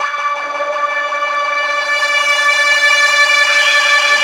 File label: HOLD BRASS